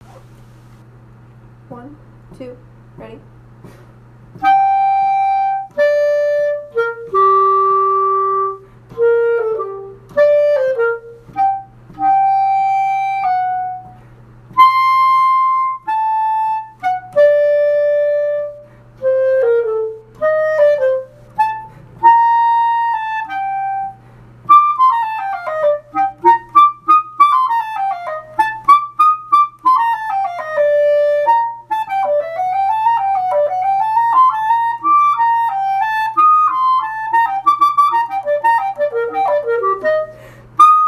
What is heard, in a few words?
flute